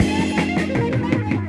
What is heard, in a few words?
Band Fill 080 F, Lounge Lo Fi Break Fillz